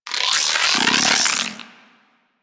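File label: Charge, Rifle; Warframe; Infested; Quanta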